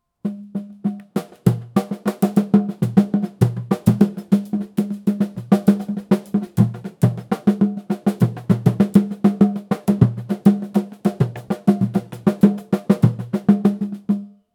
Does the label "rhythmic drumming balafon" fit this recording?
Yes